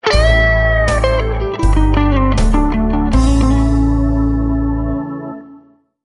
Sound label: Blues Music Alert